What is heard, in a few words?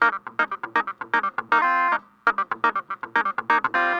Clavinet, 70s Analog Funk; Sonic Foundry (Sony Creative Software); What it Is! 70s Analog Funk